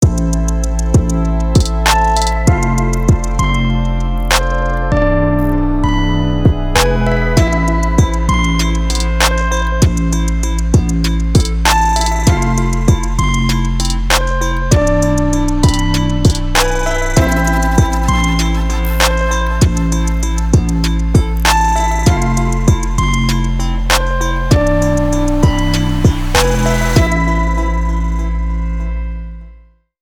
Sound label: CIRCULAR BLIPS